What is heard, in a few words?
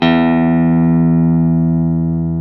Classic Guitars; E-MU Formula 4000 Series Vol. 3 – Analog Odyssey